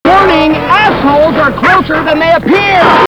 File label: warning